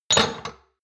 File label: shot glasses place multiple hard, gastronomie; berufe; soundpool